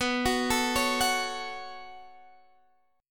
B 7th Suspended 2nd B down Guitar DADGAD; B7sus2; arpeggiated

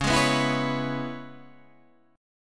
C sharp Minor 7th C sharp Guitar Standard; C#m7; strummed; JGuitar

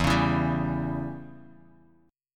E Minor 6th guitar chord, Open D

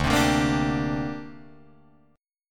D 7th Suspended 4th Sharp 5th down Guitar Drop D

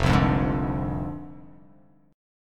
F Minor 6th F down; Fm6; strummed; JGuitar

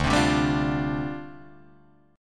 D Minor 11th D down Guitar Drop D

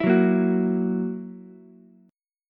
Gb 7th Gb down Ukulele, chord; strummed; JGuitar

